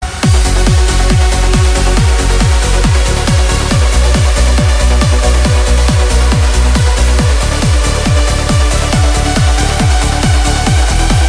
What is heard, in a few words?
Persia Trance Remix